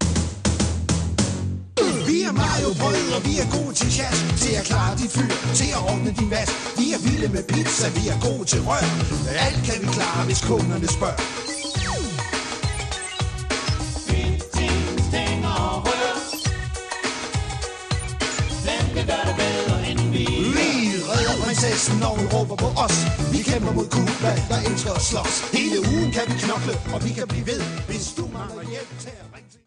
Mario Rap Danish, The Mario Rap from the Mario 3 Danish Dub